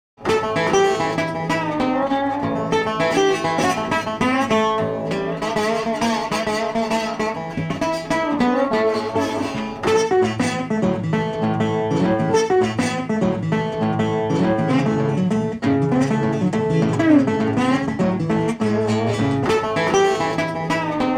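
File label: Street Resonator Guitar Happy, 100 bpm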